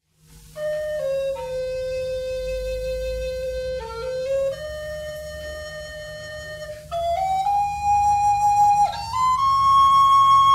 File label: recorder; Hobart Society of Recorder Players concert; Bond Store in September 1990; Tir Na Nog; a traditional Irish tune; recorded on casette